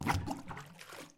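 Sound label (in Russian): вода бульк шумный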